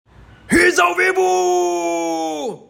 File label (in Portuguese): ris